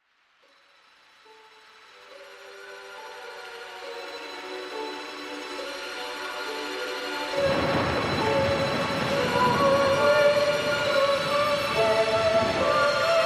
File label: Vocoder